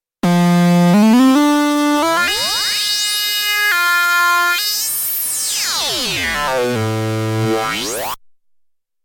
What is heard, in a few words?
Square; KOPRO+